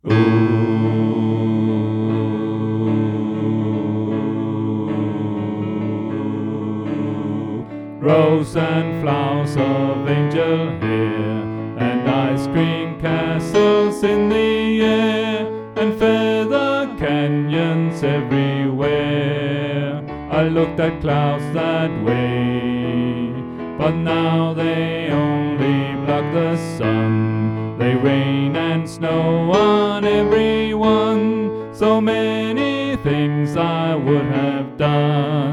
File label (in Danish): Bas; oevefiler